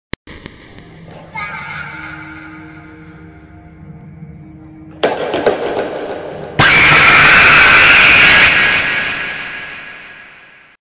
screech of a dying baby

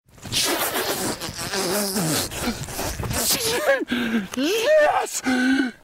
pfft

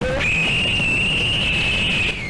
kid screaming earrape

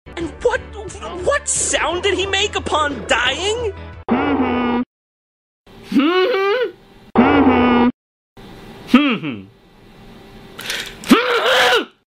hmm hmm; jontron; space ace

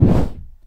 woosh

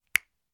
snap

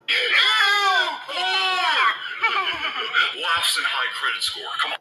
laughs

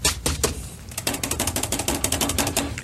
brush clean; bobross

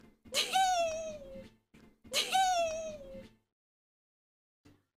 teeheee

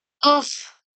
ugh

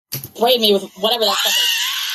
screaming

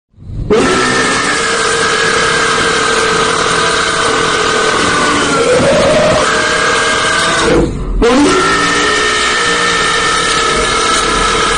loud burp

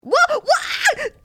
panicked wha

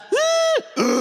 hhhhh uuuu

